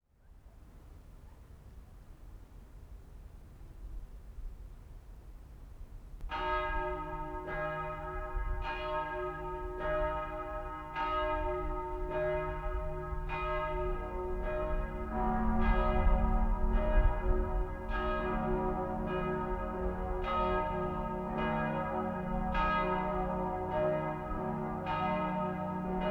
Two Bells 7pm

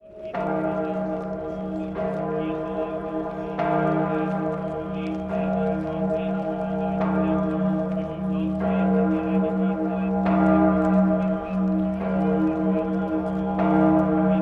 Salzburg Bells

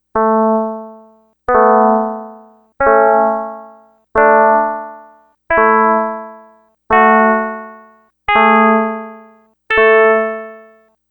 Scale Pythag Chords

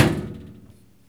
saberswing

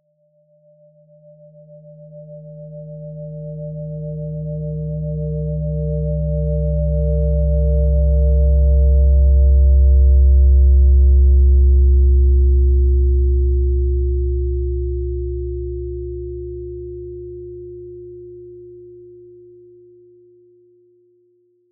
Hit, Earth & Steel

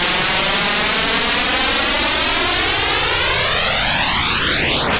Flanging

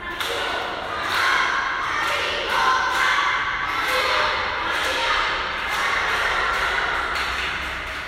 Kids, Kids chanting, San Jose del Cabo, Mexico; Kids chanting, San Jose del Cabo, Mexico 8"; Infinity Room

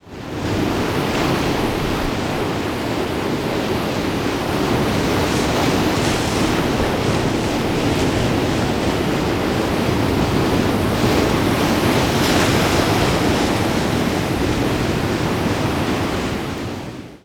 Surf

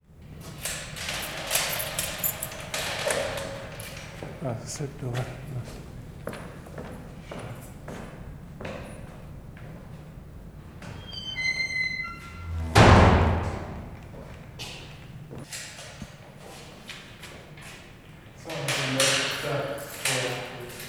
Reverb Vaults

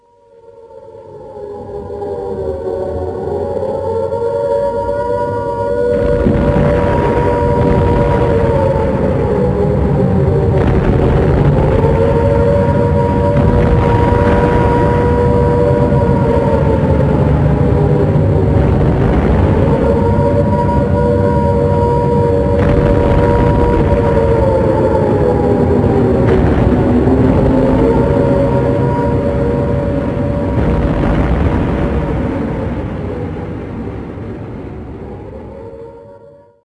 air bombing in london; wwii

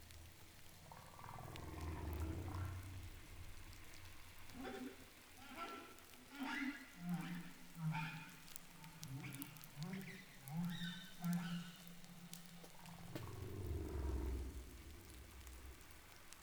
Humpback calls

